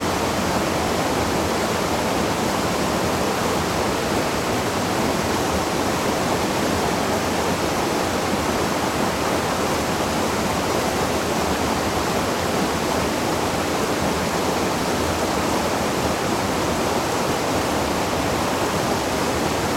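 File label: water weir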